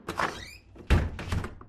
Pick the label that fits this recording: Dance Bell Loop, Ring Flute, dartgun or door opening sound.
door opening sound